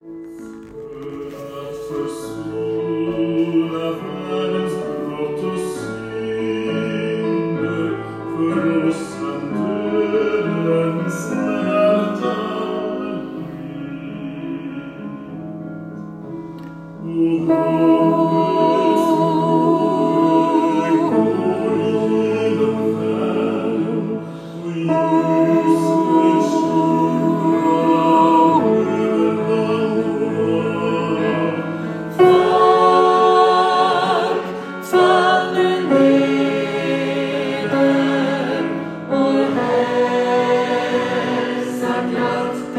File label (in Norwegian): o helga natt bass; åfjord; 52 O hellga natt; Menighetene i Roan, Stoksund og Åfjord